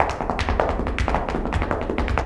Flamenco, ZAPATEADOSOL

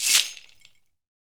SHAKER, Afro Shakers, Ankle Pods; Roland LCDP14 Africa VOL-2

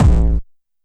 BD Analog Dist; YAMAHA RM1X

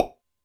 Hi Lip Pop, Drum Machines Alesis D4

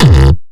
bass kick

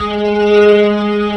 G#, The Piano; InVision Interactive

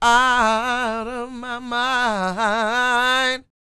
SING W, Vocal Planet Blues & Soul, BLUESY; ILIO

